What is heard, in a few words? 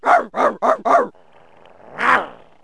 guard dog; animals; Wired for Sound Pro CD; Aristosoft, Inc.